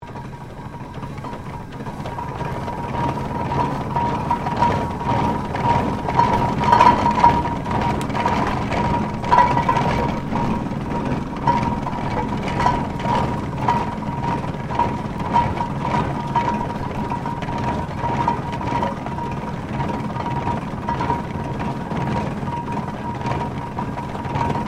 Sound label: Tractor steam traction engine 1900 operating; John Fowler